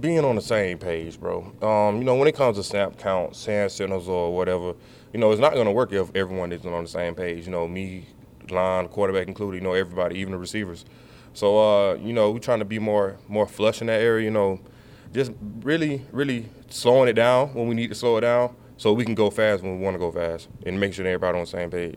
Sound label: crowd